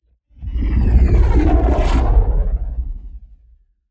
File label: Whoosh